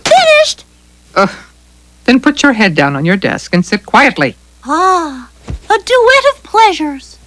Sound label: DUET